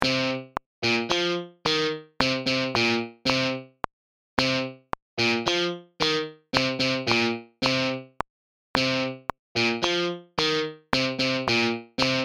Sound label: blues guitar riff